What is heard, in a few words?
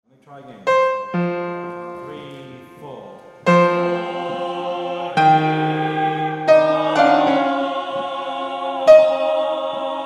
Tenor Bass; from bar 85